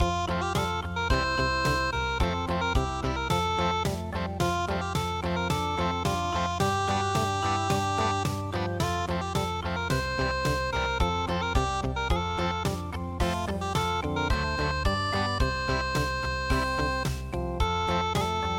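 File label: glam rock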